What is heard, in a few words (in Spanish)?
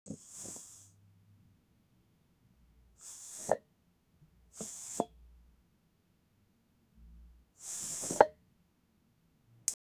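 se añade un efecto de, cloth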